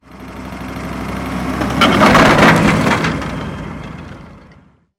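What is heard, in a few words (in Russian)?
фургон едет и гремит внутри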